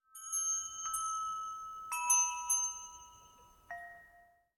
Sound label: Ambience Scatter Chimes; Josh Journey Darkness Totems; PS4, Switch, Windows, Xbox One gamerip; Santuario